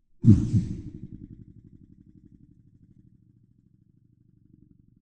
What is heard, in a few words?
explosion; Chelimbalo